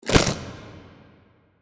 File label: kaiju adult chomp; biogenesis